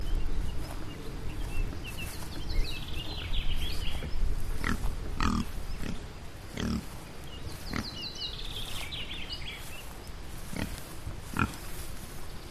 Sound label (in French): ferme cochon brebis mouche chevre